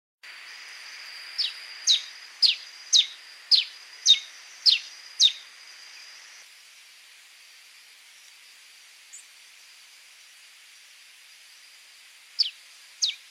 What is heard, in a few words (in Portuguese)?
Canto do soldado de crista vermelha